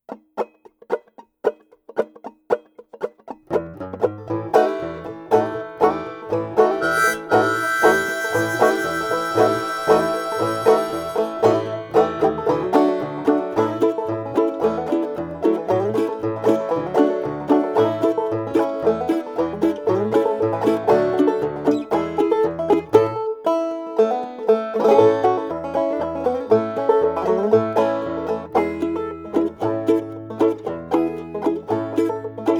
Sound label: wholegrainsong instrumental; oldways wgc